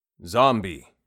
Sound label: zombie; 5etools; bestiary